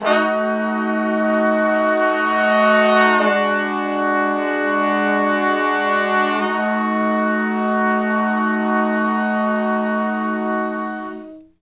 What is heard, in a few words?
Slo Blues Chords [I, IV, I, I] (B), Horncraft for R&B; Sections; Crimson Blue and Fabulous Horncraft 4 RnB